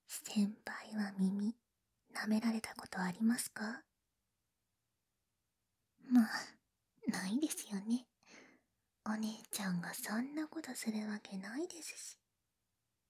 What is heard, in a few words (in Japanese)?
耳舐め(効果音なし); そそのかし耳舐め